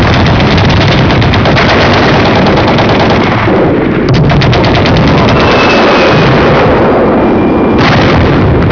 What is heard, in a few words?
battle, gunshots